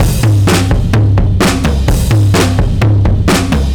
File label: Jazzy Drums 128; musicradar